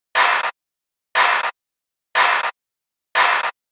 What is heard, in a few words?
First noise gate (left reverb).